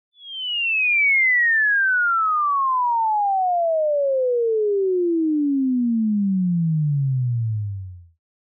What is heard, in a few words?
Cartoon falling down whistle